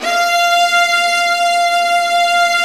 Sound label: STR, Vas2 Bright; Roland L-CD702; Vlas Sect1-2